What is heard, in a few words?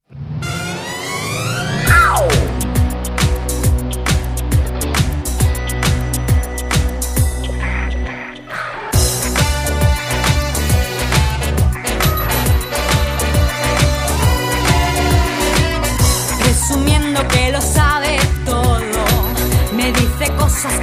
music for rhythmic gymnastic